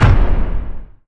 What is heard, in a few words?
chat open, ui menu